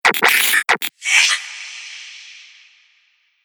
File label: Radio Stinger